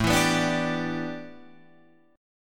A 7th Suspended 2nd Suspended 4th A Guitar Open F, chord; A7sus2sus4 strummed; 9,11,11,9,10,9